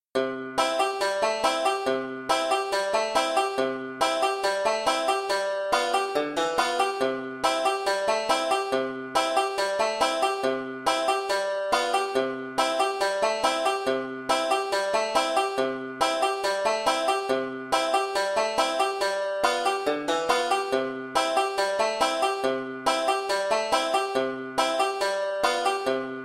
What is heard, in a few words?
acc c tuning banjo only; 5 string; oh susannah